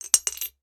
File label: money piggy bank coin insert; sound effects one